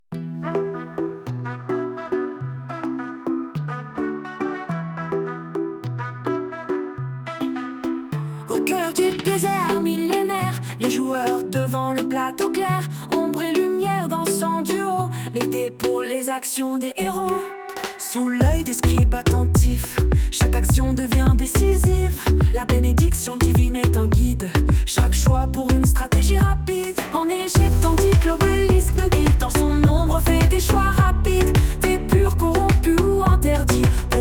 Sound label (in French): musique jeu